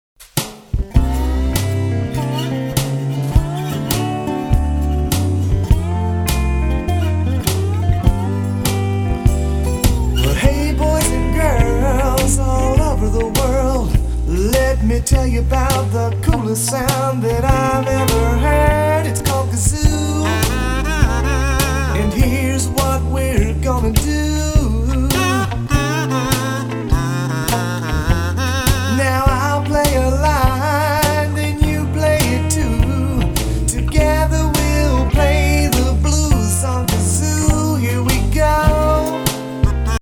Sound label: blues on kazoo